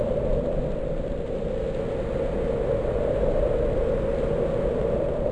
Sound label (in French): vent; Big bang